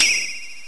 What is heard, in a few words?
AIREXPLM